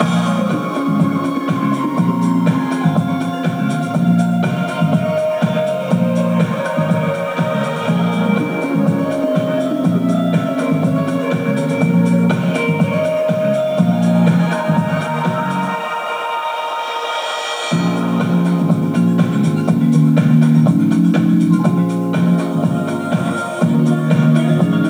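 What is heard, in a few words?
background music pub; yakuza ryu ga gotoku kiwami 2 pc gamerip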